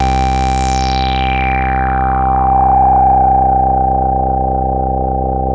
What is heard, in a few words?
MOOG, BASSES; Masterbits - Vintage Voltage; VV BASSES